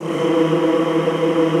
E2, Extended Classical Choir; Best Service

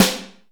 Reverb Kick Snare; Percussion; Dance Synths

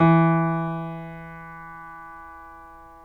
PNO Grand Pno; Club-50 - Foundations Roland

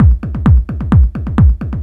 straight, Techno Trance Essentials drum loops; Ueberschall

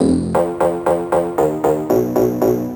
Stab Loops; neuro; Optiv & BTK

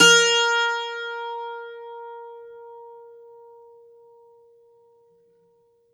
bouzouki

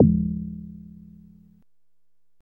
BELL; DRUMS & PERC

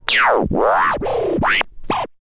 scratch kit, vinyl

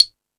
Percussion

